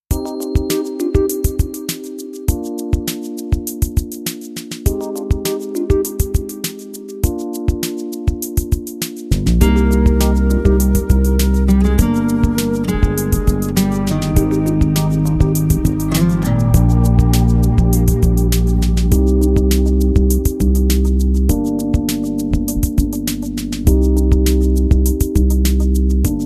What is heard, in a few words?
Instrumental